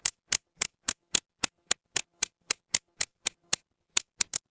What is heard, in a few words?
office pen